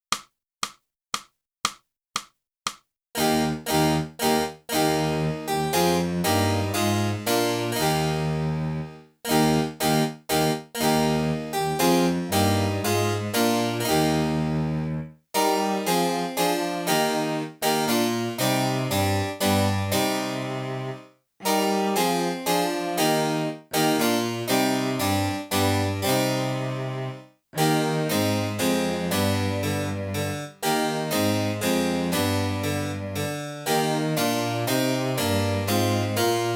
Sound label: clarinet, galliard; Boosey Woodwind Method: Clarinet (Book 2)